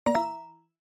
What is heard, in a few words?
error step not complete